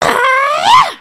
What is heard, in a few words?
air guitar; emotes